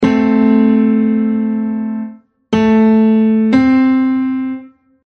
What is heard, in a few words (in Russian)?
malaya terciya